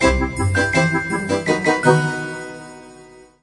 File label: Jingle, Try Again; rayman 2